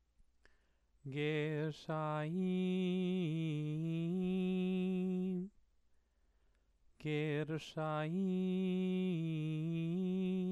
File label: Megilat Esther exercise